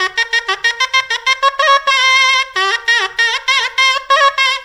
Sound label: World Lick